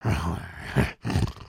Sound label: Sleep; creatures organic